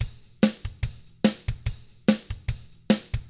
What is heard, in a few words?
ROCK; ILIO; Double Platinum Drums 1; 145 ROCK